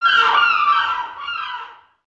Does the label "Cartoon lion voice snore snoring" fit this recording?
No